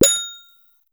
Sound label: service bell; mixkit